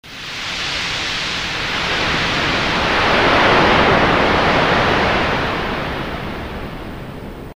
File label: sea